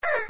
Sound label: Ouo Dwn Shrt